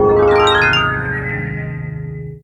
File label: SUCCESS CHIME Bells Sparkle Tune Completion, string start; Trolls